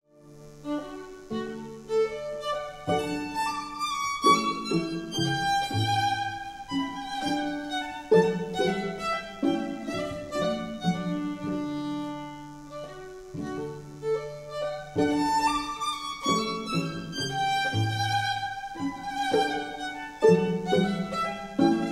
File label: Trio String Quartet; KV 421; Jerusalem String Quartet